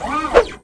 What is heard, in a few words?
ATTACK